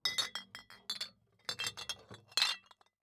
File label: Household Beer Bottles Empty Picking Up From Wood Table